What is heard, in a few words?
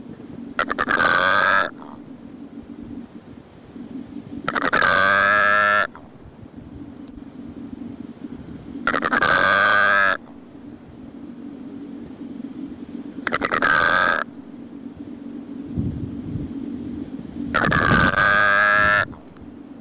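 sekretar, secretary bird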